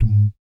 BS DUM, Vocal Planet Jazz & FX; ILIO; BASS DUMMS

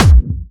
Clubby Kicks, Bassdrums

